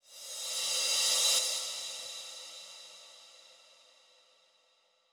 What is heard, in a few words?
135BPM Reverse Fx; Sound FX; F#minor